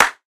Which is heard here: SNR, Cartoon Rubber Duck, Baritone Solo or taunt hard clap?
taunt hard clap